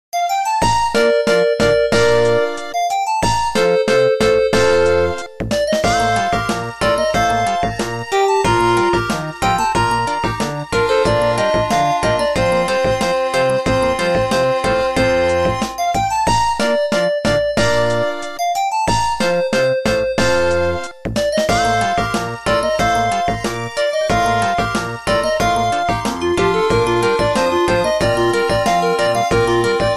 Harp concerto

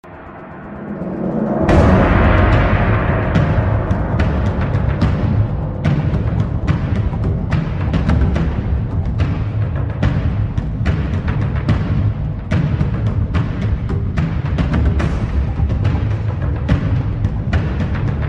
Drums of War; Dota 2

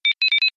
Sound Fx Sci-fi

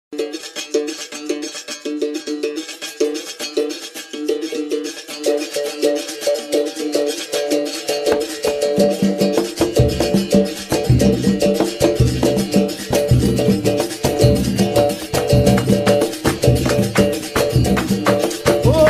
Samba

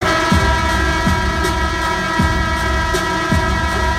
Bulls buzzer pregame

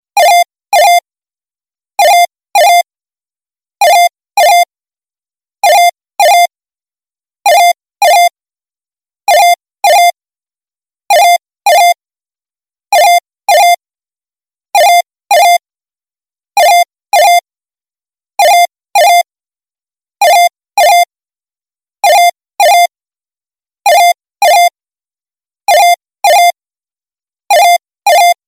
Home phone

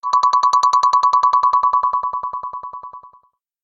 Message Tone

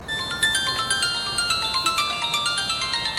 Ringing

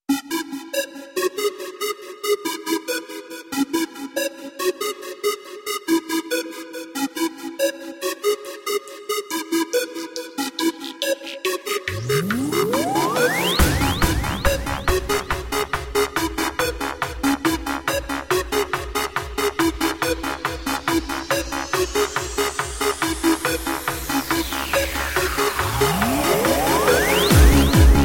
Hard Tone

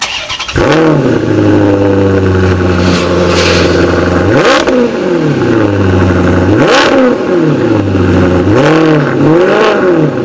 Maserati Granturismo

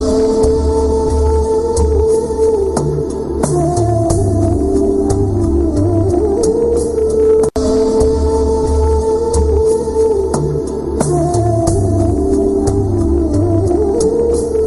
Sad Flute

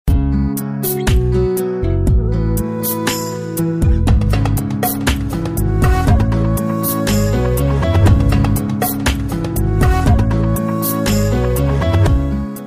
Smooth Sms

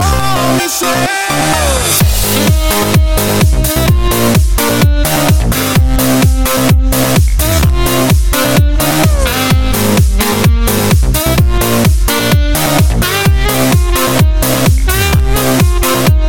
Saxo

